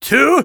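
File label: taunt rock paper scissors exert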